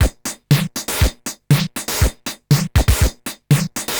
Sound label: Distorted TR 505 (120BPM), beats